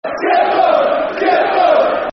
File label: Football Chant (Soccer Songs); Gippo, Gippo; Port Vale Pvfc